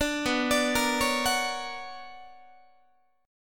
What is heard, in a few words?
B Minor Major 9th, Guitar; BmM9 arpeggiated; JGuitar